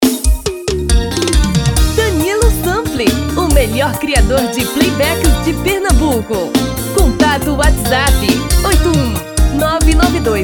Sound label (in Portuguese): Brega